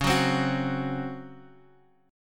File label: C sharp Major 7th Suspended 4th C sharp; strummed; chord; 21,21,22,23